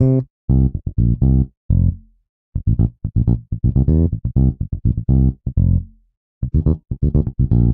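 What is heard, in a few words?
Bass Funk C major 124bpm; Guitar and Bass; Ableton; Factory Packs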